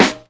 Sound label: snare